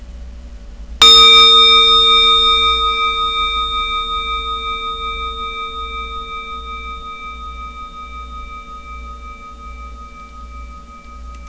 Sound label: japanese rin large; Tibetan Phone Bell Timer